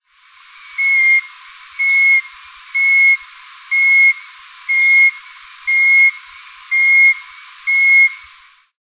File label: Cophyla phyllodactyla